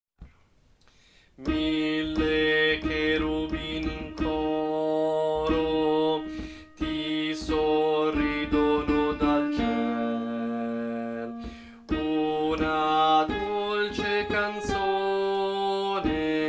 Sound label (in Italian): contralti, coro; Coro Isola del Giglio; Natale